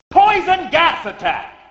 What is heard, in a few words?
poison gas